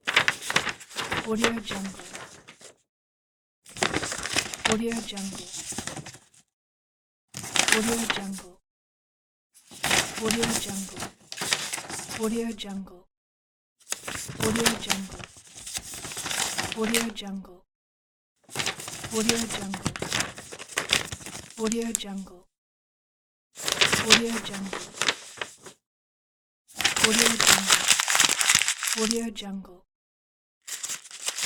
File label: paper; مچاله کردن کاغذها